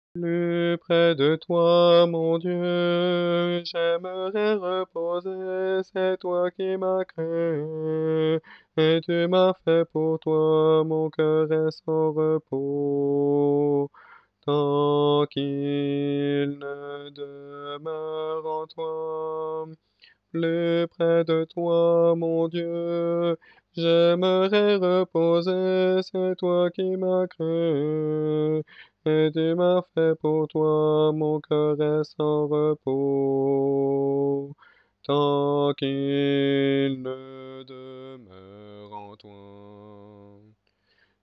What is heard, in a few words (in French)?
Plus pres de toi alto, mariage